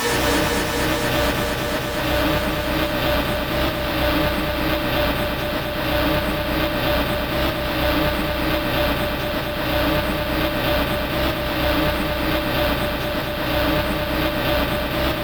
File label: rave sequence stab Bm 126; synth loops